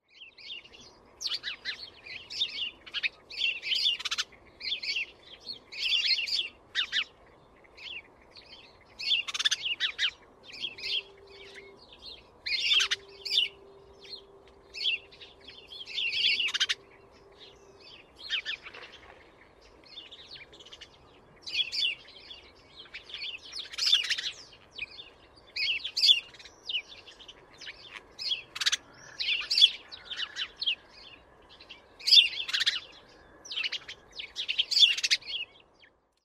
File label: house sparrow